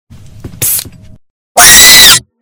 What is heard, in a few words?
doors screech; super duper hyper hard mode